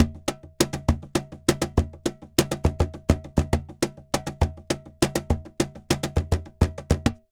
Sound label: Cajon 136; Best Service; Festa Latina VOL-1; Drums & Percussion